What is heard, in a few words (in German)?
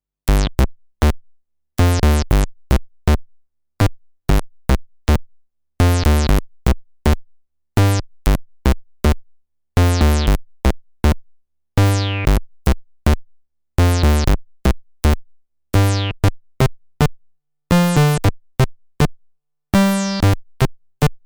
PulsBass mit Resonanz